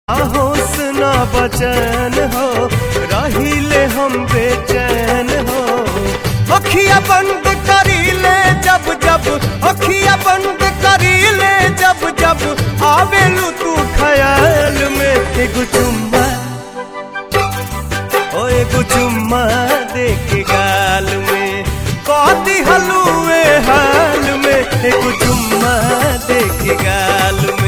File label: BHOJPURI RINGTONE